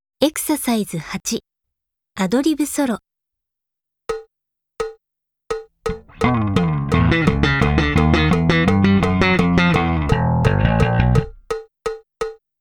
Solo Ad Lib; K-ON

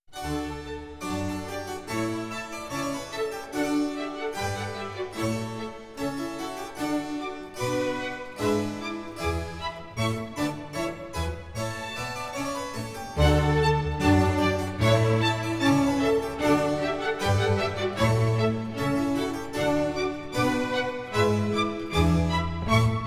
Concerto D minor Allegro moderato; Concerto No. 10 (Hendel); Legend of Galactic Heroes; Galactic Empire SIDE

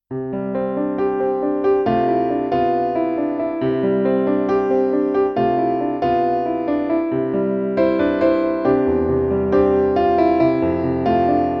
piano version; Zero no Tsukaima

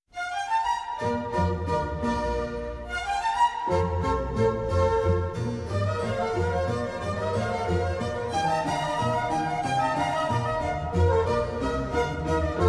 harp concerto first movement; Hendel; Legend of Galactic Heroes; Galactic Empire SIDE

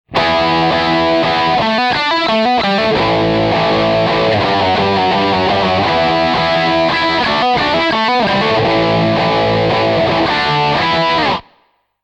Guitar Image; K-ON

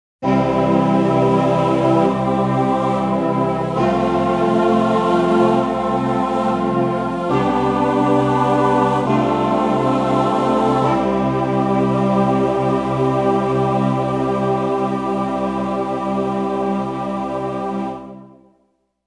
Organ interlude; Figure 17

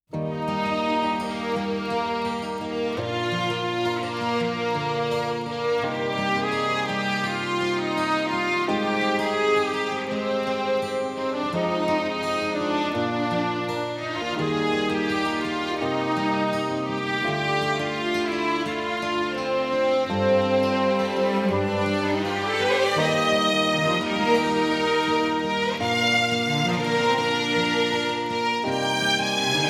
strings, slow tempo; Neon Genesis Evangelion; Evangelion S2 Works

